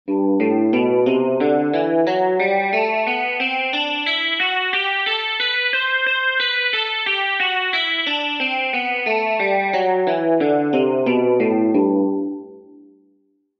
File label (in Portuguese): Exercícios Guitarra 60 bpm